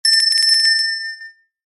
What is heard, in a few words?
bell small ring close clean shop door bell